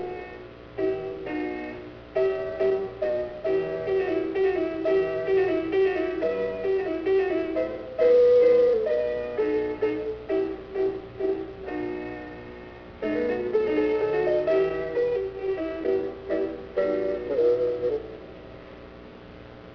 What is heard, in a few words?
bassoon